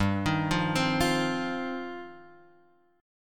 G 7th Suspended 4th Sharp 5th G down Guitar Open F; G7sus4#5 arpeggiated